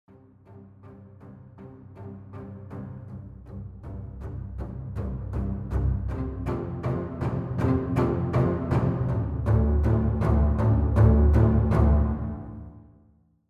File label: String Ensemble; Native Instruments Symphony Series String Ensemble